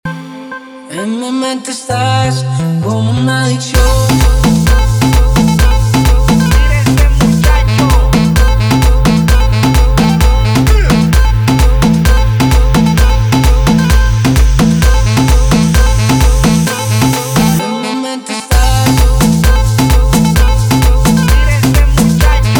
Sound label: guaracha remix 130 bpm